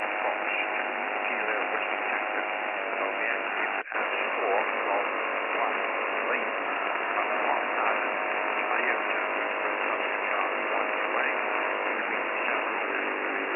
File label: 80m SSB strong variable qrm; Combined SSB strong QRM Bypass